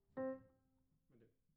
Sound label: Piano A